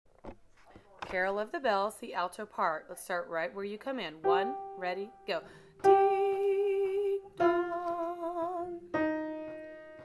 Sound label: carol of the bells alto